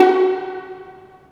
STR VLN PI, String Sections, Violins Pizz; Roland LCDP13

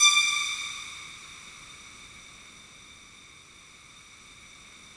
FOGATK3 D5, Yamaha VP-1; Music Store; 90's Sample CDs; FOGATTACK 3

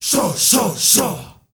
SHAWSHAW L, GRP CHANT, Heart of Africa; Spectrasonics; Heart of Africa 1

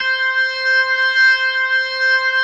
C5, B3

